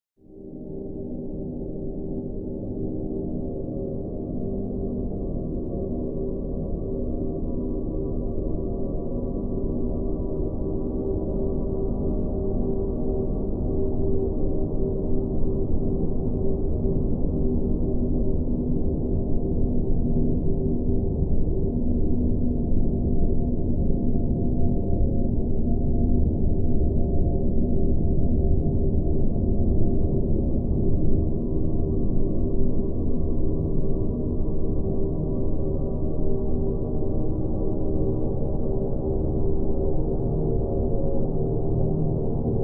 Bed Alien World Lfe

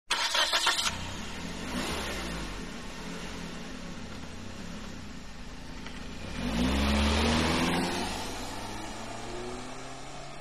Nissan Sentra Start, compact

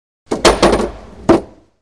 Bowling Ball Bounce

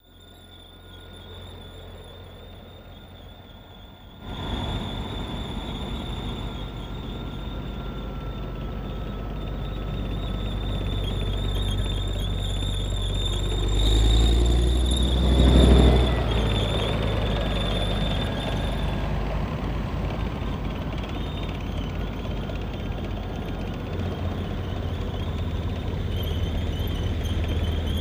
Tank Leopard Up and Past; tank mvmt

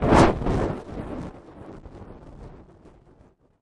Torch Thrown Down; torches

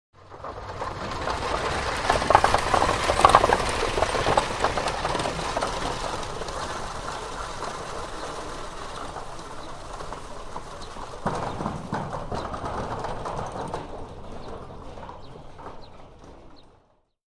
Carriage By Fast Into

